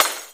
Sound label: keys, Misc Percussion, Ticks